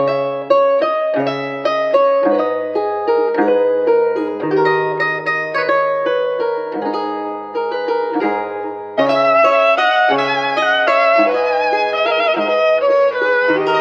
guitar italian villas; Loops; Phonky

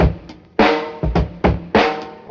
Boombap drum loop

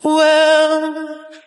well, SUNG; ooh ahh

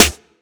Snares, Real

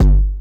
zoom, Heartbeats; Kicks

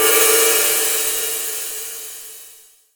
Cymbals

